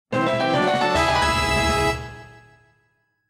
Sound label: Level Up Fanfare; Four Swords Adventures